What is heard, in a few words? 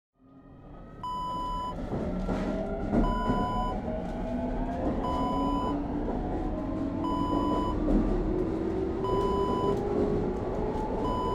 metro int ambience